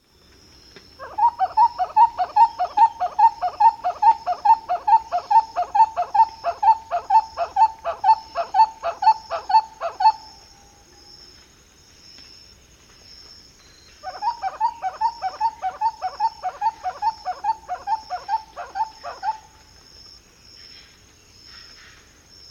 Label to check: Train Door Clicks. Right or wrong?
Wrong